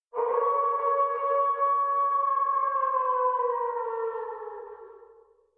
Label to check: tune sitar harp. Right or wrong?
Wrong